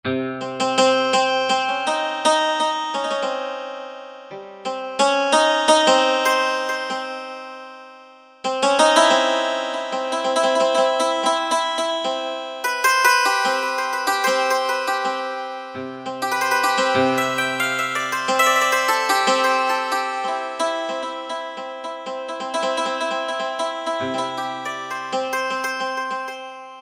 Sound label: Dulcimer, Yamaha PSR-3000; Factory Voices; Perc. & Drum Kit